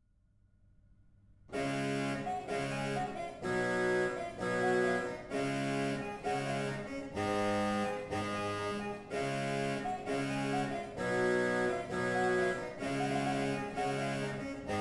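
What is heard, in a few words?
Danse, organ; Schnitger; Friesland; Lubin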